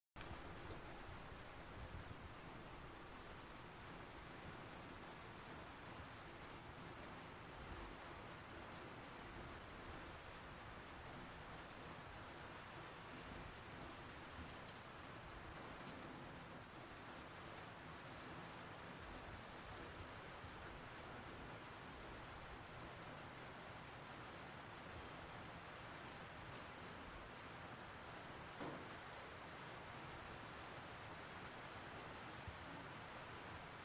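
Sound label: rain from the house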